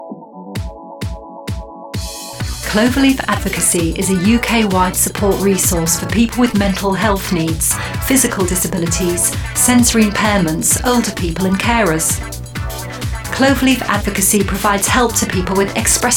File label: Jingle